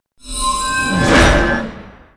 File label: Defend Elf Fire; Shua